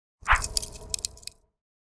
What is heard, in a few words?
melee attack swoosh magical effect cold; weapon swooshes; psfx